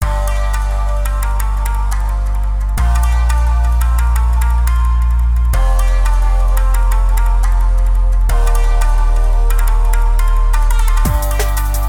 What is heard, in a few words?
Minigame loop